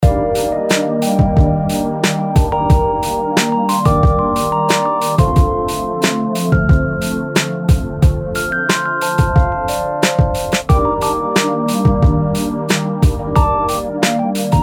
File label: simple bgm loop